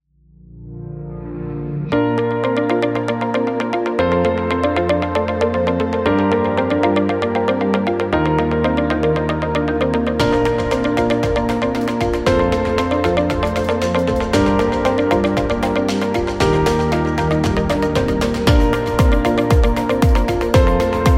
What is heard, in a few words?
Medicine Background